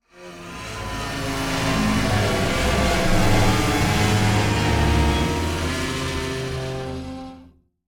Strings and wires dissonant bow stroke

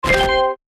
Horror survival game menu ui confirm

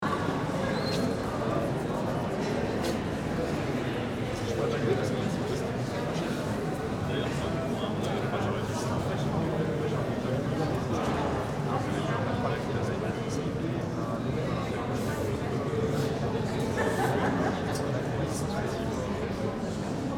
Crowd chatter murmur large hall

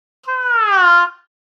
Cartoon disappointment

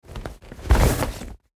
Winter jacket putting on; clothing

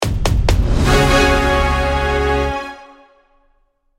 Ta da reveal; film and tv

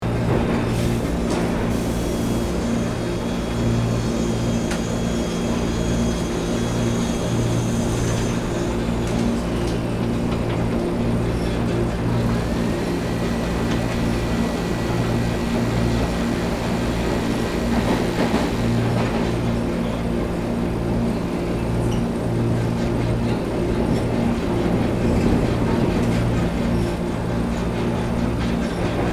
Alien space facility atmosphere

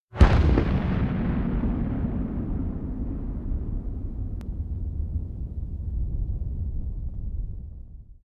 Nuclear explosion deep rumbling

